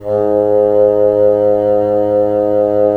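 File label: wind bassoon; LA Composer vol. 3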